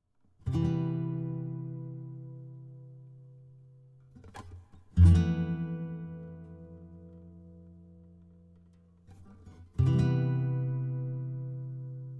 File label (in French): valse triste